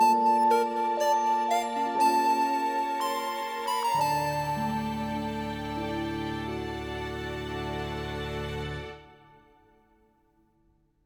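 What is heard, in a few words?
Music Game over; Born of Bread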